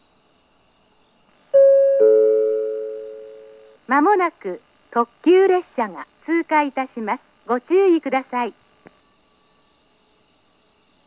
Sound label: pass limited express; kuroi; jr-east